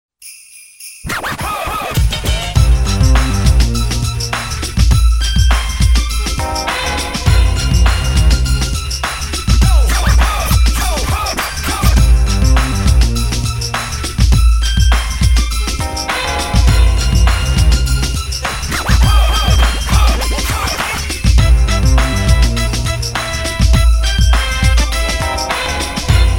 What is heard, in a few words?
JINGLE BELLS HIP HOP